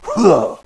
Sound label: attack; caleb